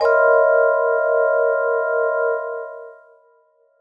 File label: clashing vibes